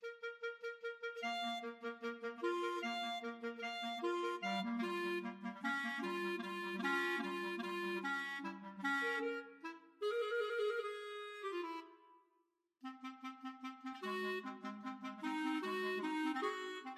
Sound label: clarinet duet